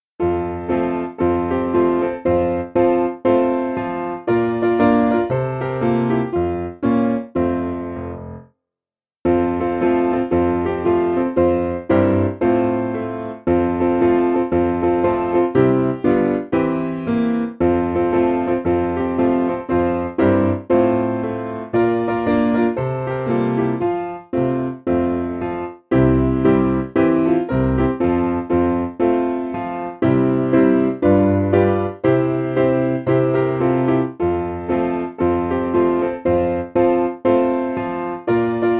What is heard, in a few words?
Piano